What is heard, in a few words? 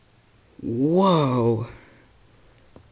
woah